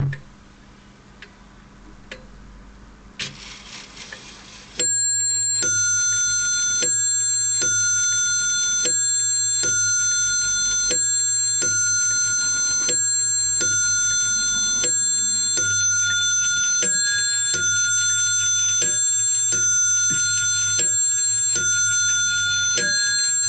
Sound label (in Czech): podlahove hodiny